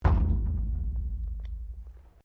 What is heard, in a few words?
METAL THUMP QUIET; Metal (Sound Effects:Materials)